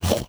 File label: goblin toward; Crypt of the NecroDancer